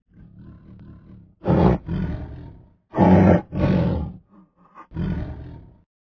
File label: tigers; animals; Duplo Zoo